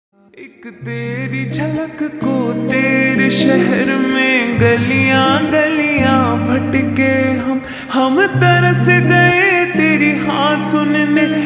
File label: Slowed Reverb Ringtone